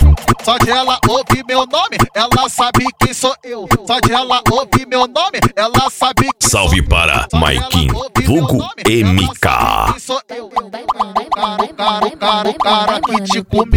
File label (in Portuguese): funk sertanejo